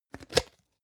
plastic can small lid open hard slow

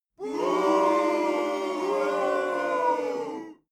boo short

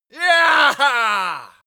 male victory triumphant

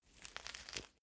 mandarin peel single

